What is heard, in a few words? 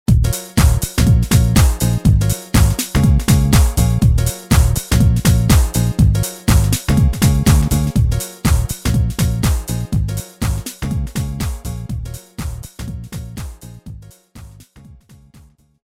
House